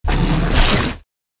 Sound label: Tiger